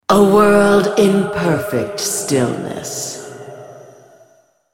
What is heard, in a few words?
move; BlackIceAnivia